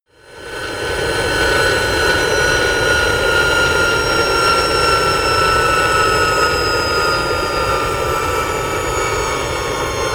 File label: plane bombardier private turbine slow down; flugzeuge verkehrsflugzeuge; verkehr transport